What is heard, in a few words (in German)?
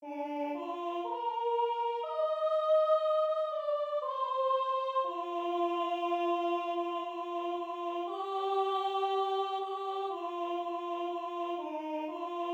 Sopran